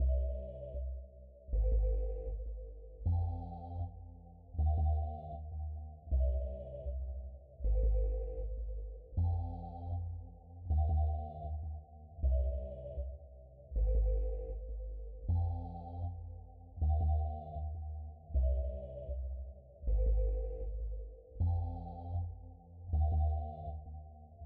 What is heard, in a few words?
157 cminor, Omnisphere